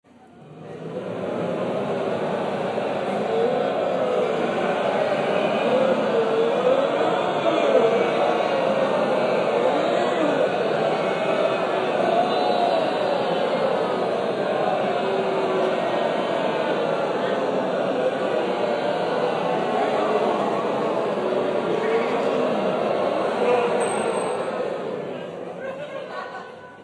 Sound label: zombie crowd; webmaker